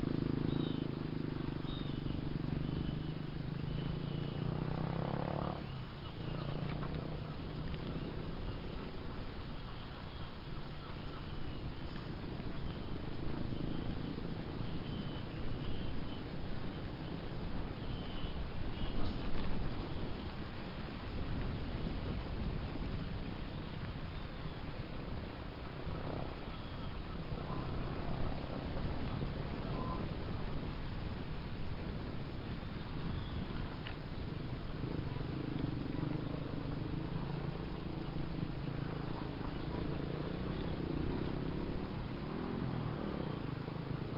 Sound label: Chainsaw and Motor